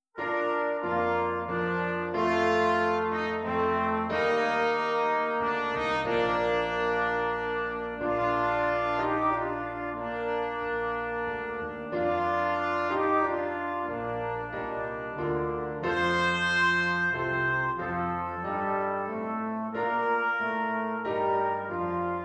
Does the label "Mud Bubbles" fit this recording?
No